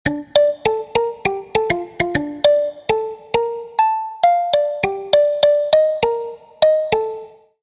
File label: Fujara Overtone, ringtones; Infinix XOS 15